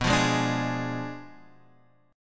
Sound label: B Diminished 7th, down, Guitar, Open F; Bdim7 strummed; JGuitar